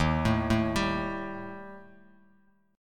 D sharp Major Flat 5th Bass Standard; D#Mb5 arpeggiated; 11,12,7,12; JGuitar

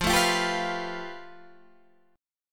A 7th Suspended 2nd Sharp 5th F, chord

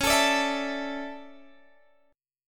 D Major 7th Suspended 2nd

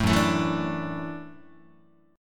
Gb Minor 7th Sharp 5th, Guitar; strummed; JGuitar

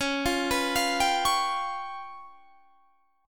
C sharp 7th Flat 5th, Guitar, Standard; C#7b5; arpeggiated; JGuitar

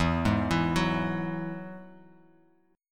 E Major Add 9th; arpeggiated; JGuitar

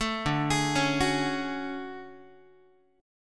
D Major Sharp 11th D Guitar, chord; DM#11 arpeggiated

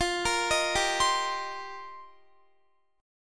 F 7th Suspended 2nd Suspended 4th F Guitar; F7sus2sus4; x,20,20,20,19,19; 4E,4A,5D,5G,5C,6F; arpeggiated

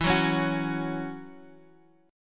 C sharp Minor Double Flat 5th E

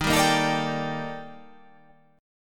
D sharp Major Sharp 11th

